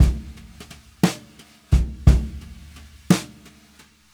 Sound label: Brushes SN SN